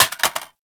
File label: Reload Drop Mag Default; Warframe; Boar